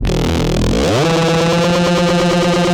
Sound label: D&B Reese Fills E 175BPM